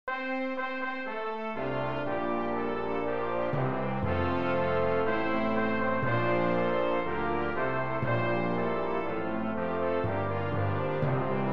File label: Brass; Glory to God